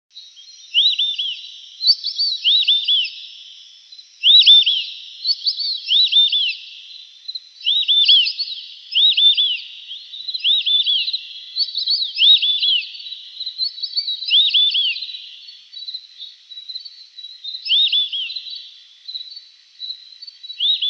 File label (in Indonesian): audio burung belibis muka putih